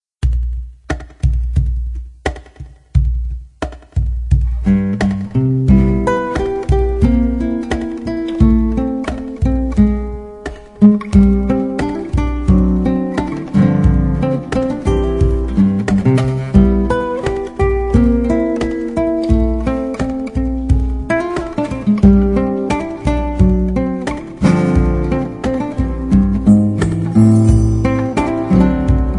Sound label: Guitar